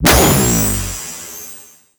Sentient Symbilyst Laser Beam Fire; Warframe; Weapons

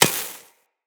Laser Impact Metal; Weapons; Bullet Impacts; Surface

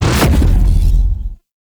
Pulse Projectile Dissolve; Warframe; Gyre

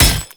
Ghoul Devourer Melee Impact

